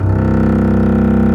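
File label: STRINGS, Cb Bowed f; Roland L-CD702; Cb2 f vb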